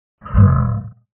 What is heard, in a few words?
monster; creature SFX